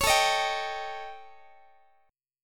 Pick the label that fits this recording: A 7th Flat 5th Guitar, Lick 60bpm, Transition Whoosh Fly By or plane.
A 7th Flat 5th Guitar